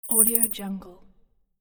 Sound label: future swoosh; audioheart